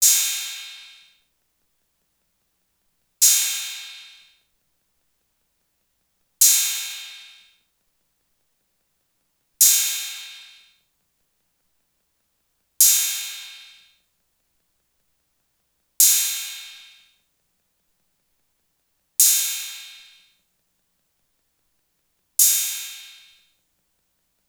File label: TR-808 Cymbal; Roland; Instrument samples